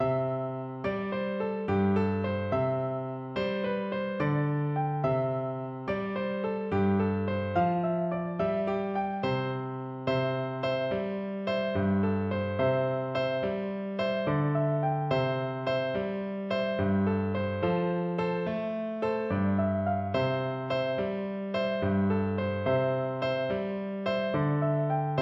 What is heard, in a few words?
swaggering jig, Flute